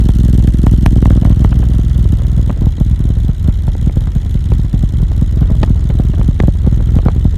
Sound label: F800GS Racing Sound